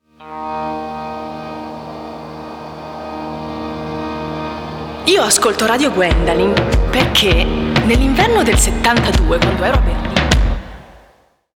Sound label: Jingle